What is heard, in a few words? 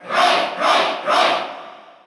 Cheer Russian; Roy Koopa; SSBU